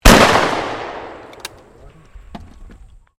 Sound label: SHOTGUN 12 GAUGE DOUBLE BARREL FIRING CLOSE, Sporting Shotguns